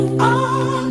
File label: uohhh